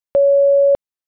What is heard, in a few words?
Beep; moh